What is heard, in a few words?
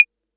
808 CLAVE; Concrete Collage Drum Kit; Concrete-808